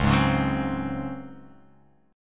Db Major 7th Suspended 4th Db down

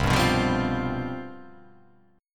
C Major 7th Suspended 4th

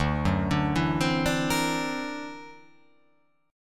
D Augmented 9th down, guitar

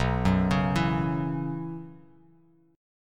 B Suspended 2nd Suspended 4th, Bass Standard; arpeggiated; JGuitar

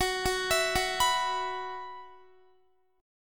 F sharp 7th Suspended 4th, Guitar; arpeggiated; x,21,18,21,18,19; F#7sus4 Chord

